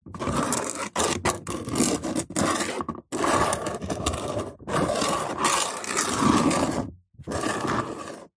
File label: plastic object sliding movements gritty garage floor; foley; zapsplat